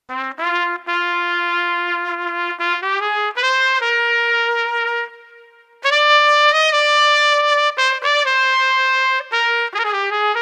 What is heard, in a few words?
Street Trumpet Lethargic 80